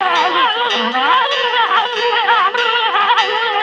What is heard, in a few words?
flying bird feathers loop